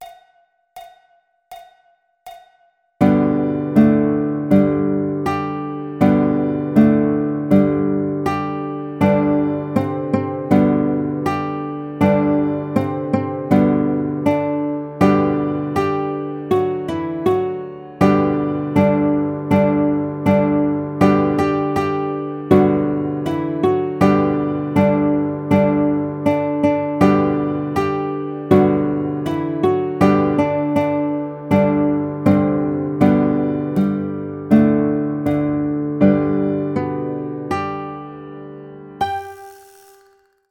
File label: Three Blind Mice Guitar